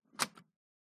household stereo hifi tape cassette deck close shut